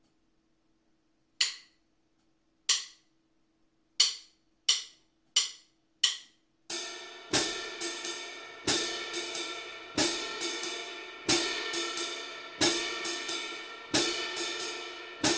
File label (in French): swing feeling triolet, batteur